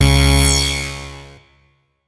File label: tesla tower; UnityTowerDefense